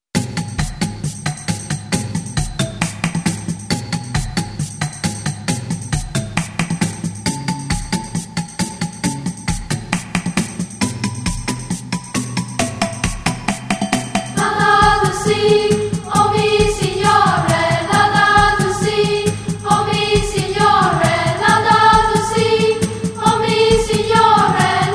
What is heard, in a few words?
school song english